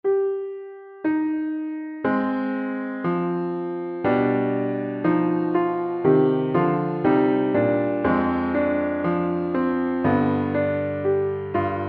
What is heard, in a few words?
Piano Cm